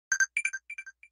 Beep; igimon CS

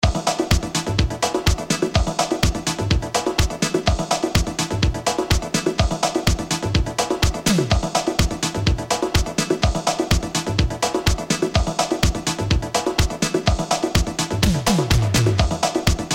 new wave drums; alternative

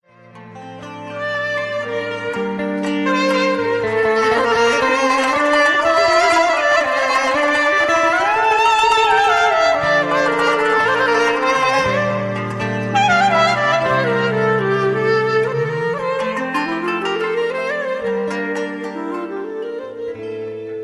klarnet

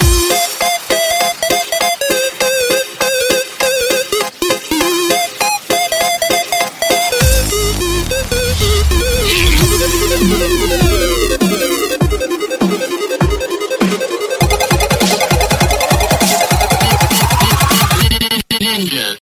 Dub Step; Ninja

